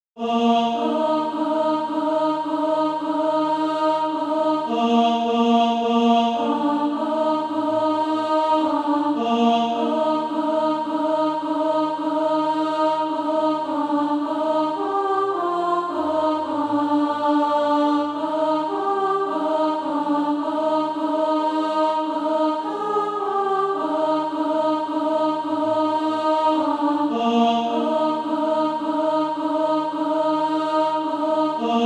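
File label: alto, Practise Music; From Greenlands Icy Mountains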